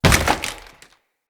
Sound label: Ode Lantern Crate Land; Objects